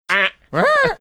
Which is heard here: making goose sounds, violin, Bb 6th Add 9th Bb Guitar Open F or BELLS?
making goose sounds